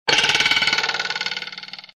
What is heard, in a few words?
twang; disney; mashup